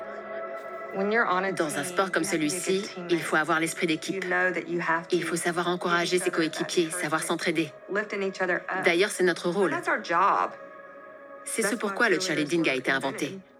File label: cheer